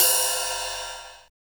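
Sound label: LINN RIDE, machine cymbals; Drums In Your FACE!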